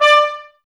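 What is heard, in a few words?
trumpets D, brass; 90 s Sample CDs; Miroslav Vitous; Woodwind Brass Ensemble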